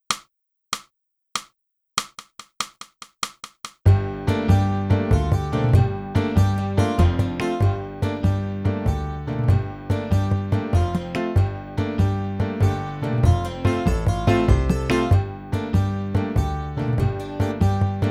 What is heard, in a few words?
clarinet; (Morgan) - Boosey Woodwind Method: Clarinet (Book 2)